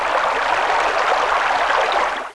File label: Water, 水声; 自然; 特酷声音素材库